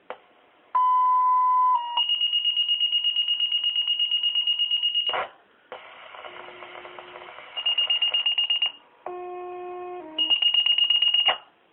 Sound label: Dayton VFD Tones